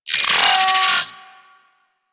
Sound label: Arachnoid Micro Vocal Death; Warframe; Corpus